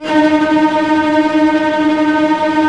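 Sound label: cello tremolo D#1; Digital Sound Factory Virtuoso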